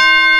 TUBULAR C5; Big Fish Audio; Synth City

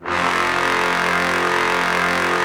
BRASS F2; Greytsounds VOL-1

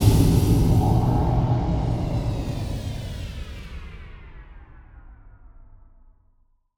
Impact; impact samples

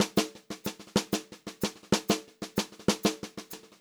VARI, Pop-Rock Drumloops; USB Soundscan vol.37; AKAI; 90 s Sample CDs

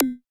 MG Zap; Roland BK-5

